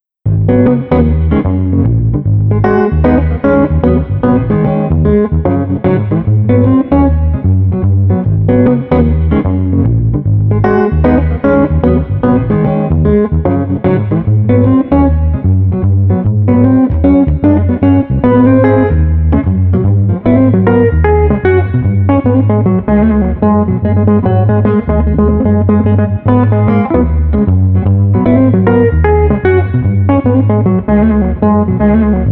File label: Street Guitar Bass 120